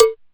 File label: Cow Bell, DIGITAL KIT